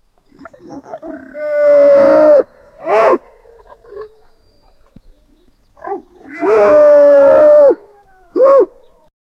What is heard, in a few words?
two wolves attacking and, bite